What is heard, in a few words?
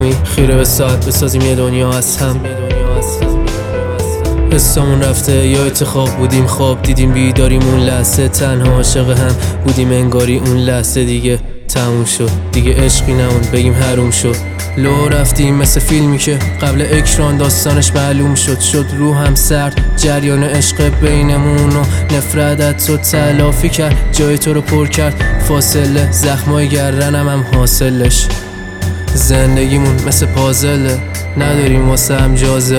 Ritm Piano